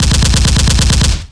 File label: hm chgun; PC Gamer IT CD 32